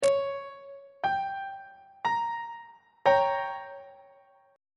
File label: g diminished 2nd inversion on treble clef